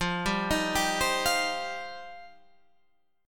F 7th Suspended 2nd F down

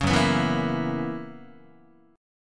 F sharp 11th F sharp Guitar; F#11; strummed

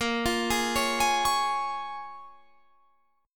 Bb Minor 7th, Guitar, Drop D

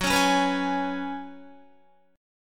F sharp Suspended 2nd Suspended 4th, Guitar; strummed; 13,16,13,16,13,16

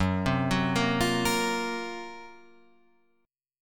F sharp Major 7th Flat 5th

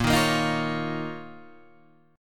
F 7th Suspended 4th Sharp 5th A sharp

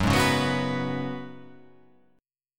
F sharp Suspended 2nd Suspended 4th

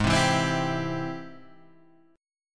G sharp Augmented Major 7th G sharp, guitar; G#+M7 Chord {4 3 2 5 5 3} strummed

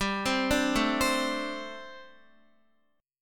G Suspended 2nd Suspended 4th, Guitar; Gsus2sus4; arpeggiated

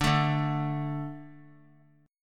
D 5th D, down; D5 strummed; JGuitar

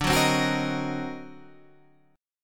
D 7th Flat 9th Flat 13th

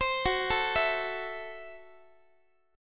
Gb Minor 7th Flat 5th Gb Guitar; Gbm7b5 arpeggiated; x,x,15,16,13,15; 4A,4E,5A,5D,5G#,6C#

